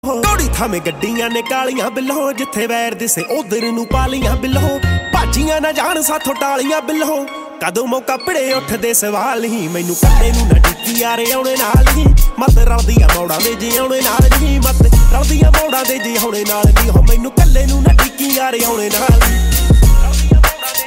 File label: PUNJABI SONG RINGTONE